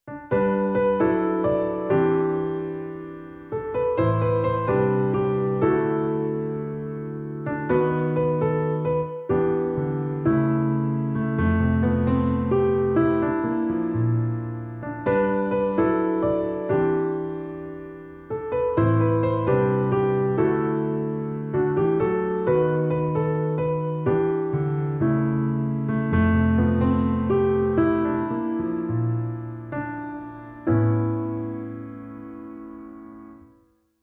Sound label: Solo Piano